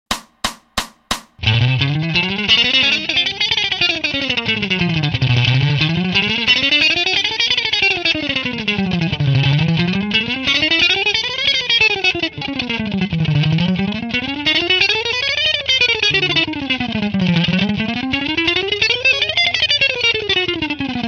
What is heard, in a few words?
Chromatic Scale 16ths fast